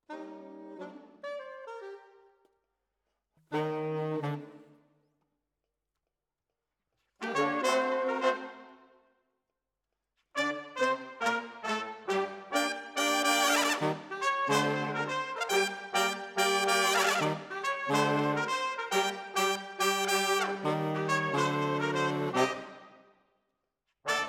Sax

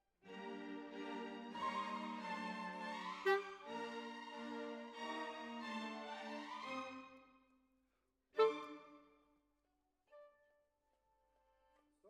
Clarinet

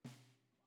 SNR BOT

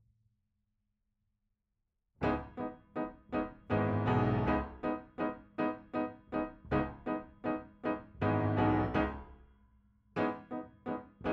Piano B

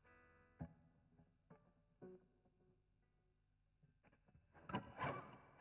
GTR A Solo

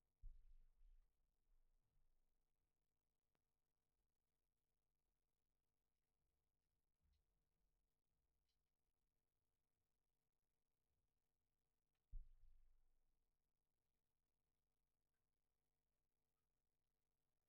Kick In

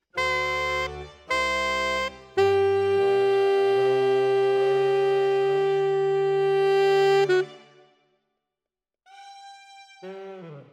Alto, RNR for piano

